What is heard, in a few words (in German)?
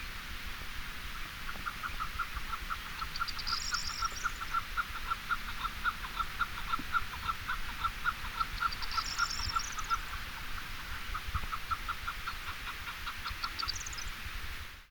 chukarhuhn